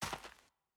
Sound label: Footstep Dirt